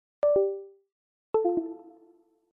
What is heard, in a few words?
Device disconnect sounds